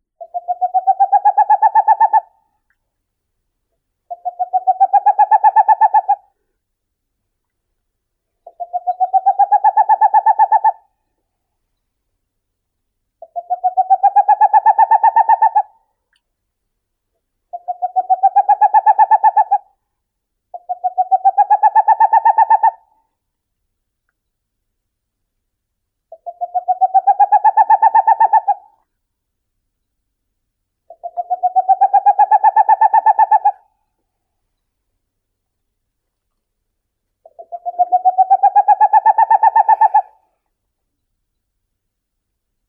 sich mohnonogii aegolius funereus; zvuki prirodi

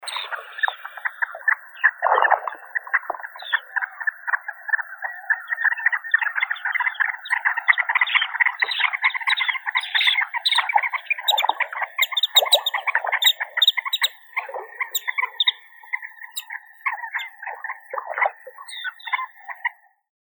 pesochnik bolshoi calidris tenuirostris

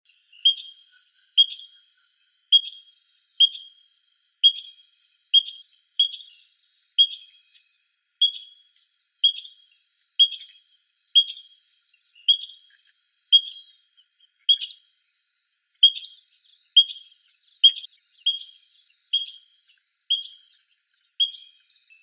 zvuki prirodi suslik bolshoi spermophilus major

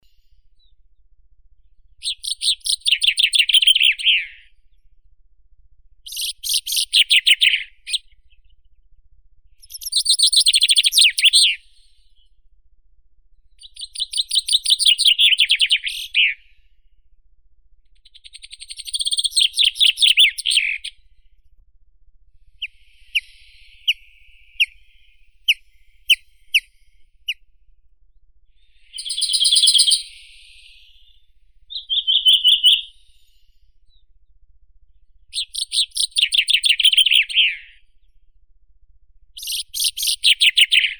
zyablik fringilla coelebs, zvuki prirodi

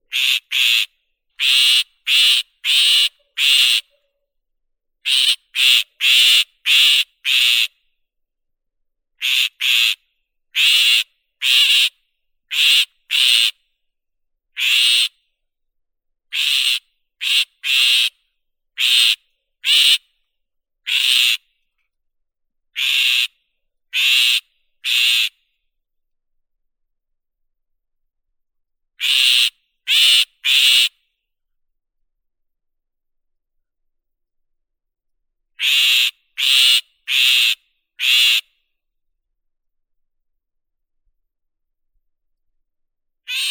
zvuki prirodi sorokoput serii lanius excubitor

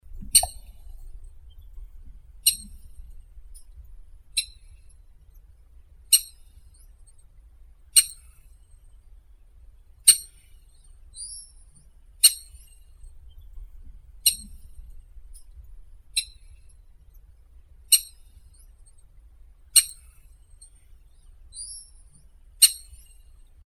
zimorodok bolshoi pegii megaceryle lugubris, zvuki prirodi

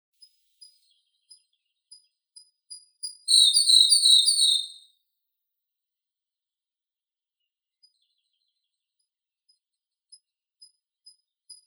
zvuki prirodi solovei sinii luscinia cyane

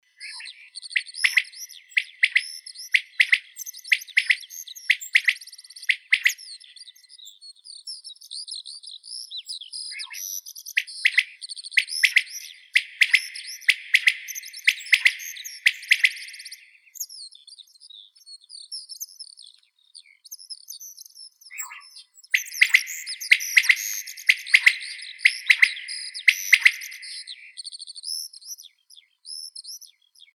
perepel coturnix coturnix

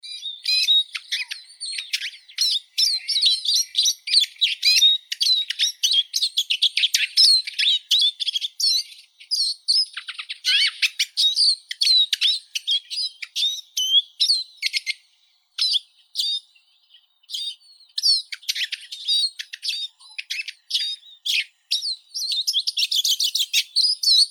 chizh spinus spinus

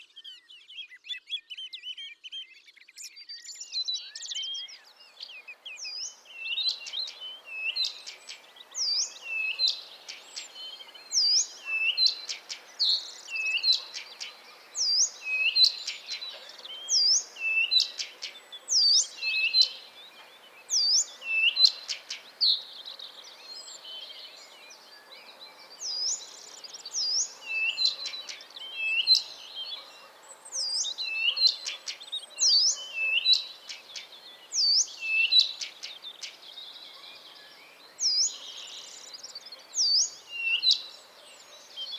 sadovaya kamishovka, zvuki prirodi

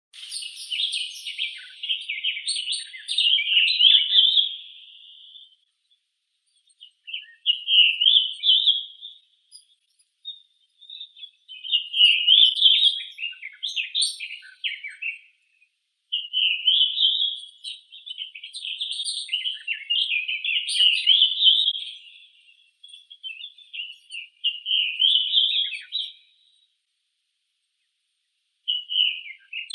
drozd belobrovik turdus iliacus

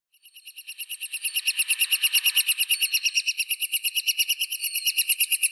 dyatel pestrii malii dendrocopos minor ptenets

